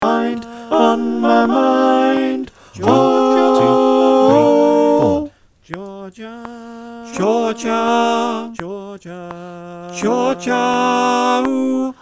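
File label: tenor clicktrack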